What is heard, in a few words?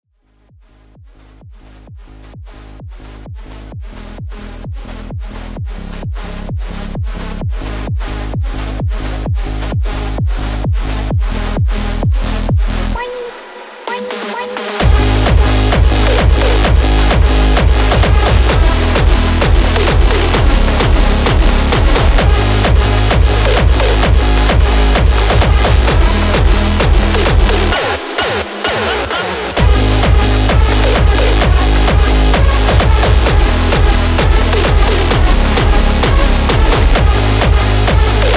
Phonk